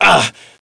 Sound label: pain, player